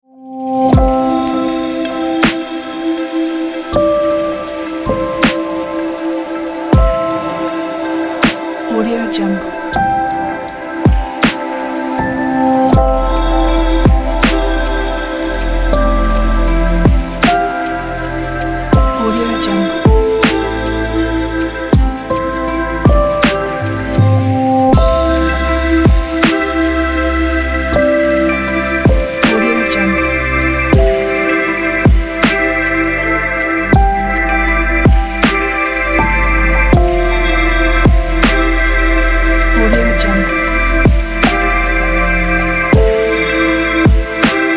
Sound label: the calm background